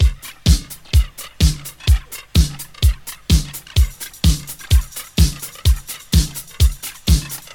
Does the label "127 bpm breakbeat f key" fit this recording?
Yes